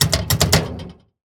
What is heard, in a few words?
metal door locked